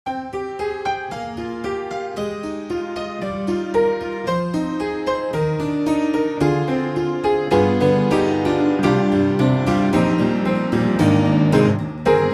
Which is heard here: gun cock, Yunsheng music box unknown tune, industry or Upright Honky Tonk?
Upright Honky Tonk